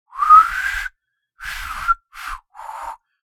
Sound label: Human failed whistle